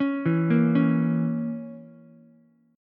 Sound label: A sharp Diminished Ukulele, chord; arpeggiated; JGuitar